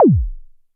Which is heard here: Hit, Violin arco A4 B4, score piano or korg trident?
korg trident